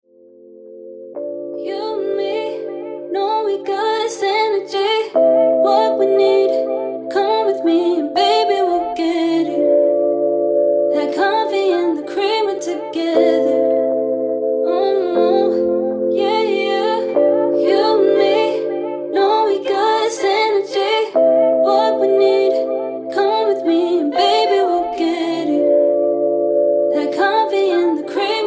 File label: Afro House